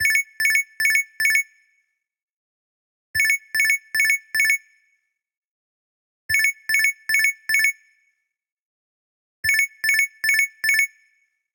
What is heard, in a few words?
Alarm; LG; Stylo 2 LS775; alarms